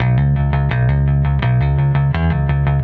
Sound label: Electric Bass; Basses